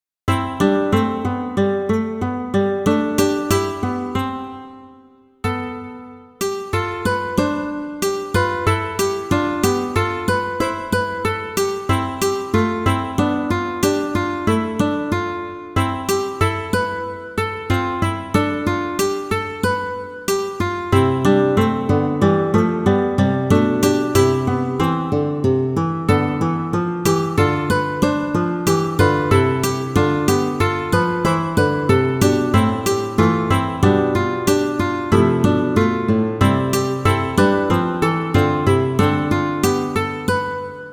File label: contrapontic study; avec tablature